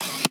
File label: Camera Single